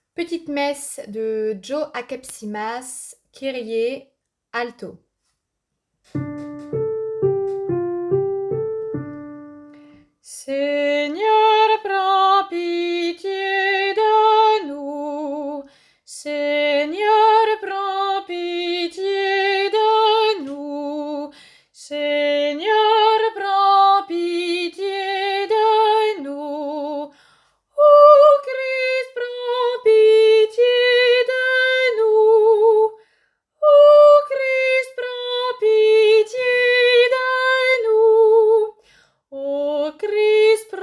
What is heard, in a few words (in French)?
Petite messe kyrie alto; Akepsimas